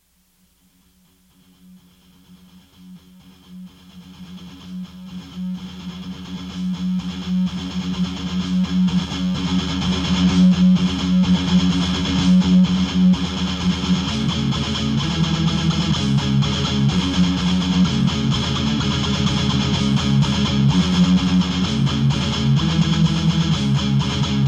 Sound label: Riff Tapes